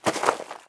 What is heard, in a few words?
ledge step; Golden Crayon Sounds